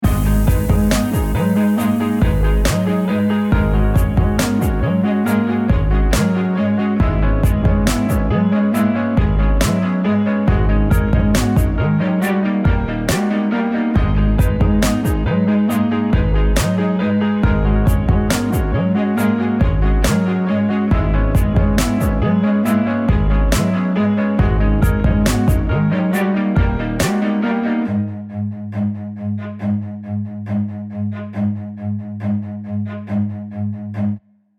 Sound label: bomb planted